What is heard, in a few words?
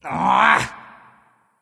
"ugh"; smite; voicepack; sylvanus